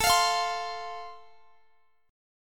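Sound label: A Minor Sharp 5th, Guitar DADGAD, chord strum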